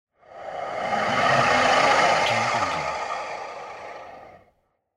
Horrible Breath

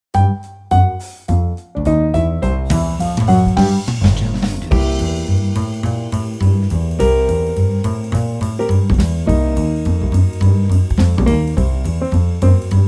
Happy Swing

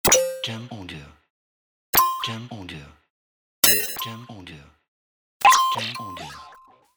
Cybernetic Noise

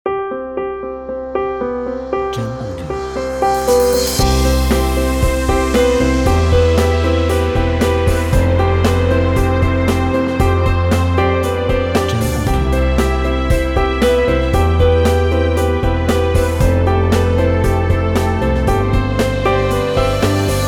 Corporate Background Intro

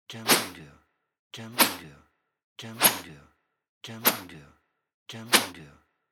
Whooshes

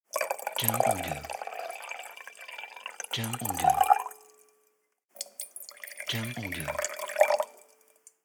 Wine Pouring

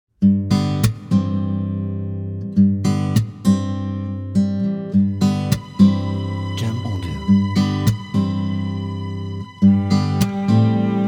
Guitar and Cello

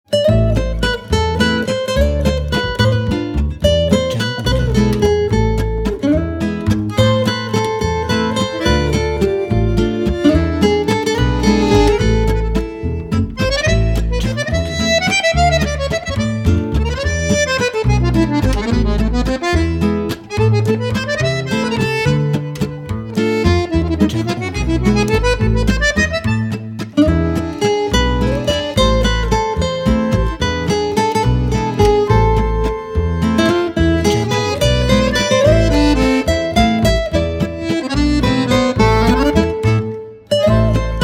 Paris French Accordeon

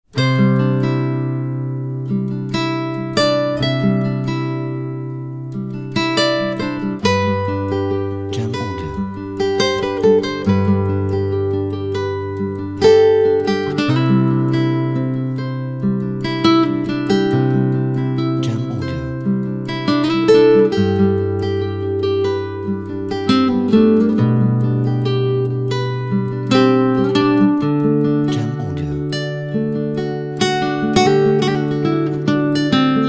Soft Guitar

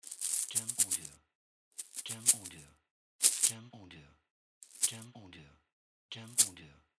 Shake Coins

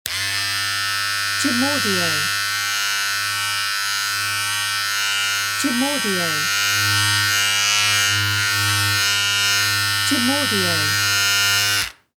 Beard Trimmer

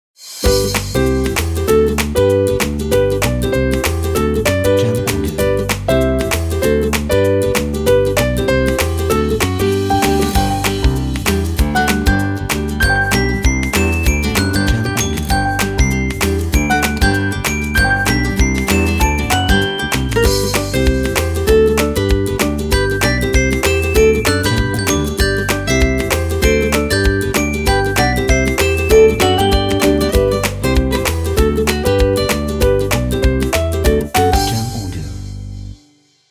Positive Kids Background

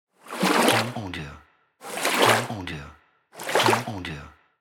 Wave

